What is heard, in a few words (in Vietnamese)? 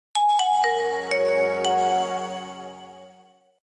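nhạc chuông khởi động nokia bản gốc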